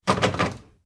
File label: Locked Door